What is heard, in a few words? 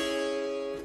stringy, Synths; Notes